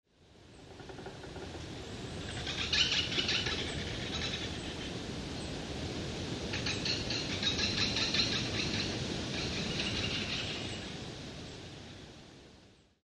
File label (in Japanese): ostoaka; amami